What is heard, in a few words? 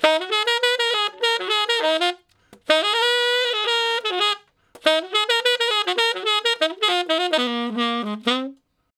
Ten Sax Straight (F), R&B; Solos